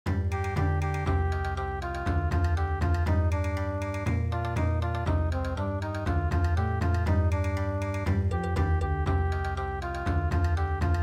Bon Odori

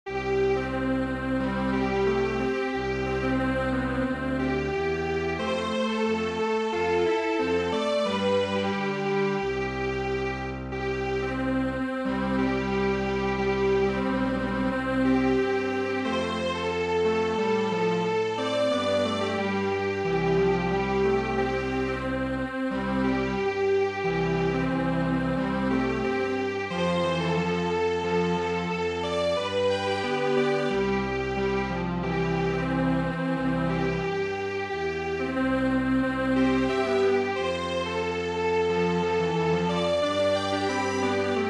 Strings Quartet, traditional; English Coast Song

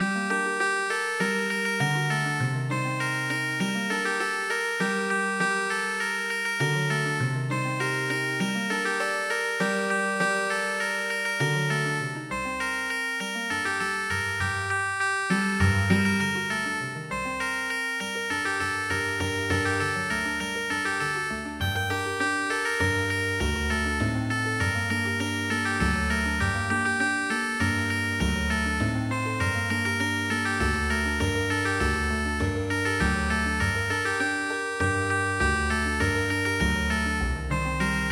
Kurdistani Healing Harp

